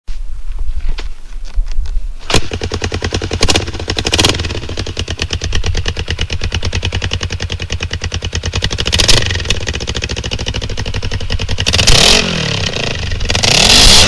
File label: TX500B In-Line Parallel Twin engine sound; 1973; Section Six: The Soul of a Bike – The Engine